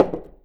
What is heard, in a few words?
PUT, household; AKAI Anime Sound FX